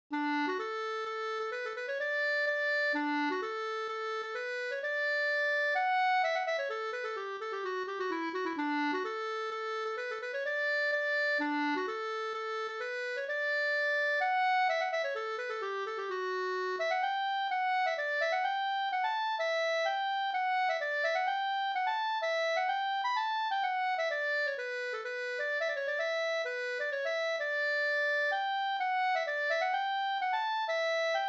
polska Bodapolska

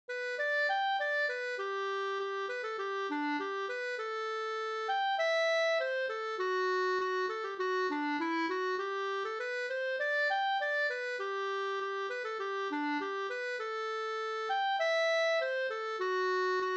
snoa; Norrland

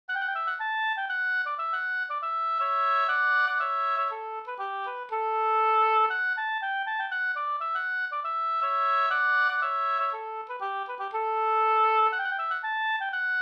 polska Springlek

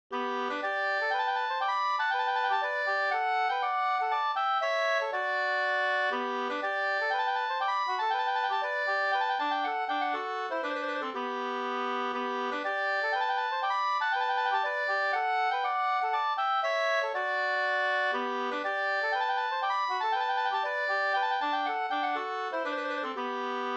polska Bodapolska

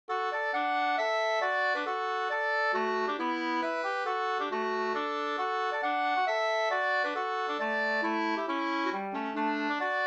hambopolkett

